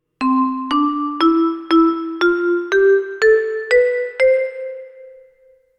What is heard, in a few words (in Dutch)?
drietoonladder